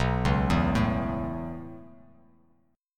B 7th Suspended 2nd Suspended 4th down Bass Standard, chord; B7sus2sus4; arpeggiated; JGuitar